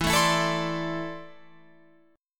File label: E 7th Suspended 4th Sharp 5th, Guitar, Open F; E7sus4#5; strummed